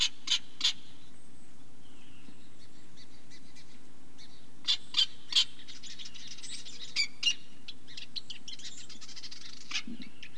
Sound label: virginia rail